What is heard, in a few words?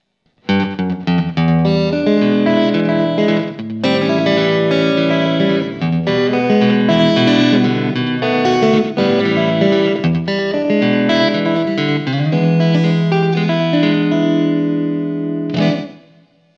ROCKABILLY, Boss GT-8